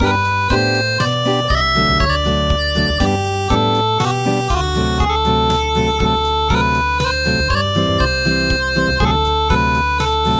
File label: Acoustic, midi; traditional; We love the heroes